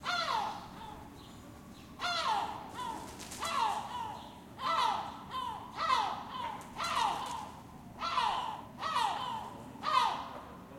seagulls; Golden Crayon Sounds

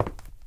concrete step; Golden Crayon Sounds

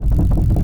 bike wood; Golden Crayon Sounds

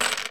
Chip, Bingo; RS Games Sounds

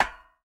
ladder step; Original TK Sounds

